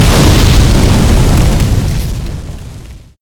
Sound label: explosion medium